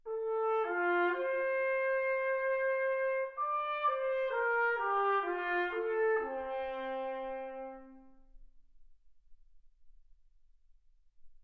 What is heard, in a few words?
trumpet